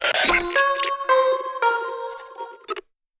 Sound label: ringtone